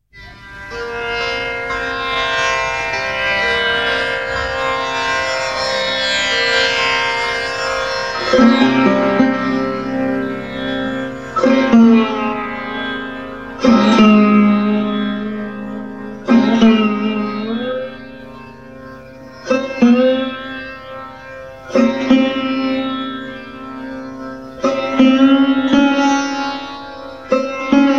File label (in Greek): Δείγμα ήχου Σιτάρ Raga Palas Kafi απόσπασμα